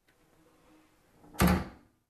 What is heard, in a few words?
Door close bump sound